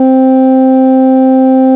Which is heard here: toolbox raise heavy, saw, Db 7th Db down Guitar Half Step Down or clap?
saw